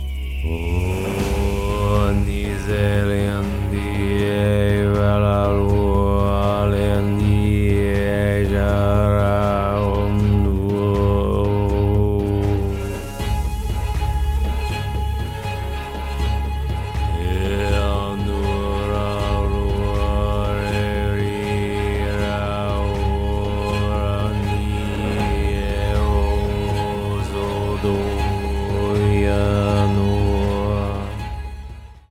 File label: Epic Dragon Majik Chants Invokations